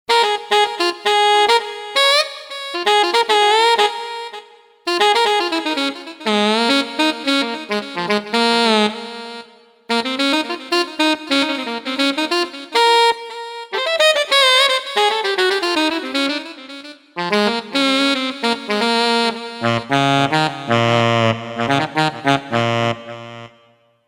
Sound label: Turbo Sax, Roland JV-880; Factory Patches